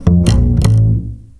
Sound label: Stick Bass F; Total Drum&Bass